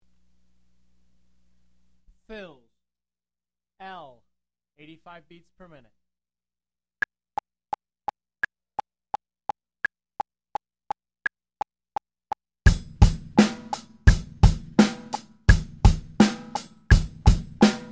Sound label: fills, Beginner Fills 85BPM; Drum Lessons